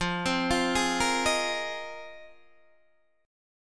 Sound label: F Minor 11th, Guitar DADGAD